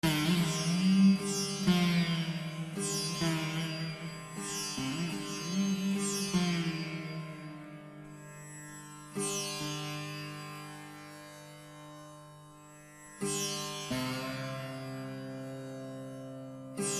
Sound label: surbahar